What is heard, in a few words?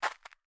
Jump; MiSide